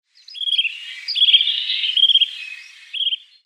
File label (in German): Wuestenregenpfeifer Charadrius leschenaultii; Vogelstimmen